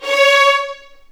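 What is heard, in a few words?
C#5 violins; The Ultimate String Collection; East West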